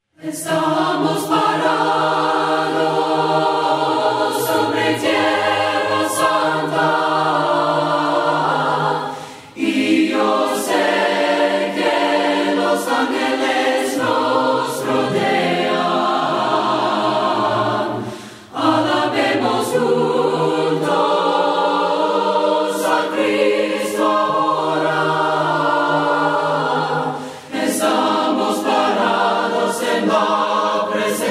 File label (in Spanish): TIERRA SANTA VOCES, himnos a capella; cantos del camino